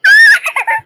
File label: laughing; bluey